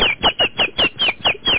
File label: birds; Flying Body Parts; Software Solutions; monster